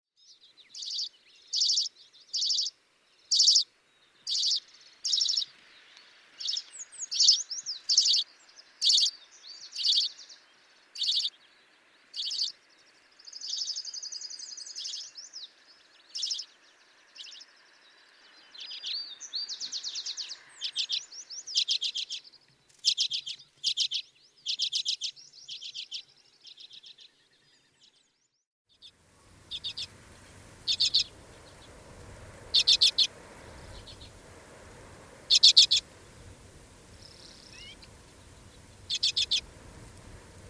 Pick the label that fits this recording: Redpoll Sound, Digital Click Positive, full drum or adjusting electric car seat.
Redpoll Sound